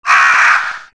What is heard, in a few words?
crow caw